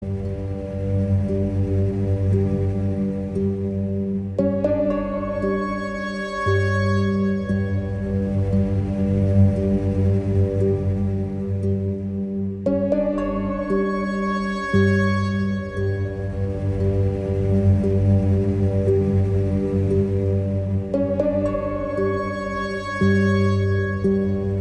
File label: electronic music